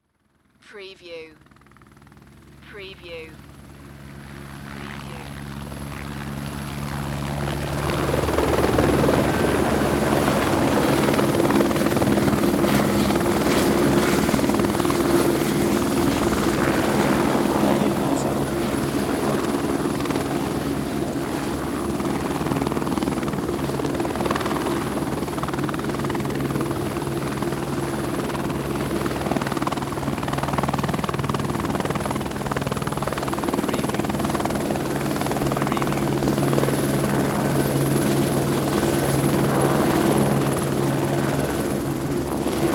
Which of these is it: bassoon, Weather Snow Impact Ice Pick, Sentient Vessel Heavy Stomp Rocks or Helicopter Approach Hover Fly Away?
Helicopter Approach Hover Fly Away